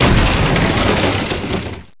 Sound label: break heavy Wood